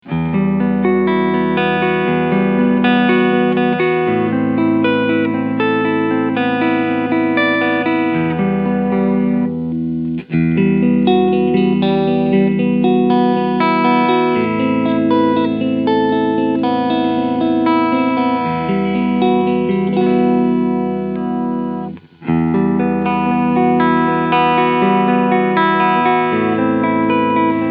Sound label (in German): GL Skyhawk E Gitarre Cleansounds Position 1 5